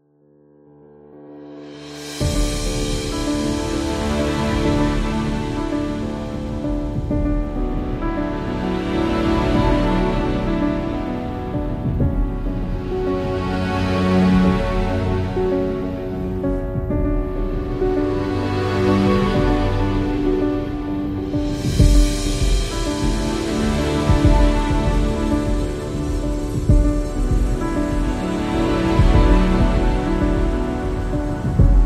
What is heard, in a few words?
Natural; documentary